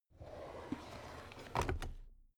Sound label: drawer cabinet stuff open soft